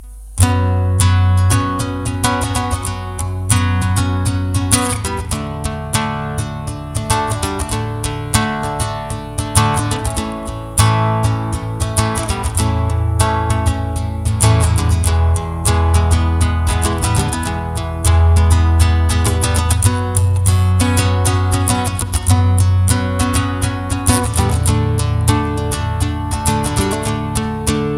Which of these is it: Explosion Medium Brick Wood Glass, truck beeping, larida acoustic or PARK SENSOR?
larida acoustic